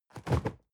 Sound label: cardboard box medium fall low soft; objekte